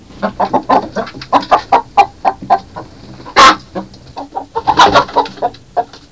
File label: hen; CyberMycha 01-2004 (Poland); Klik